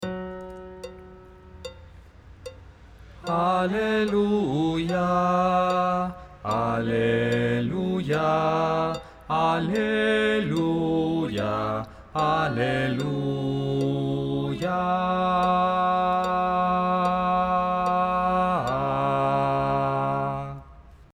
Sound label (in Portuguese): baixo